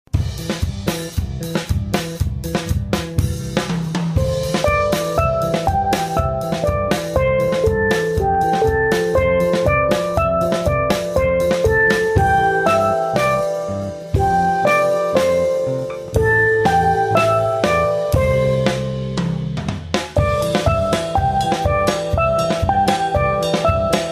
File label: C Pentatonic Song